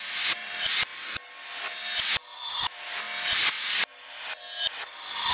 Cymbol Particle; Particles

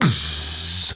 MALE SOLO, MALE NOISE, Heart of Africa

